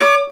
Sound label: Viola Missed note; The Princess and the Frog; wii windows; Hub Bayou; Music Riverboat